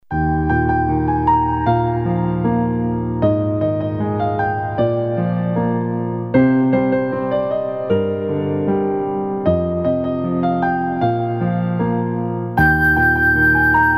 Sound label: piano